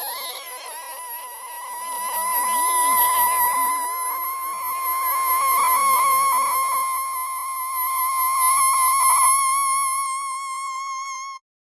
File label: mosquito